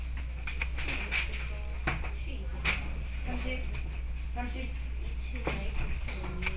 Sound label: traffic